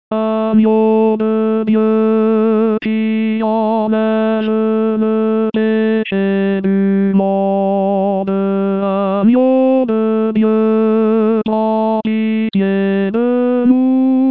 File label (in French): Agneau De Dieu Tenor, Chorale